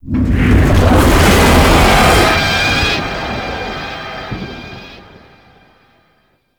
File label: Dragon Passby Death Stinger; Ambience; Gameplay; Mainland A; Duviri